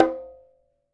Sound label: Requinto Hit, Latin Percussion; Sonic Foundry (Sony Creative Software); Joe Vitale Latin Percussion